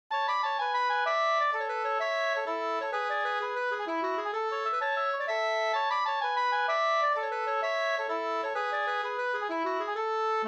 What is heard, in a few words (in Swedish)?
polska; e Johan Skaar Svanskog Vr